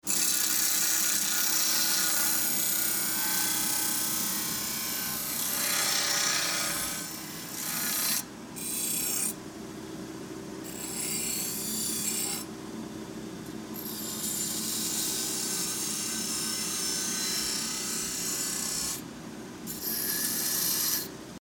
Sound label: small factory